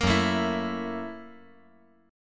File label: Gb 7th Sharp 9th Gb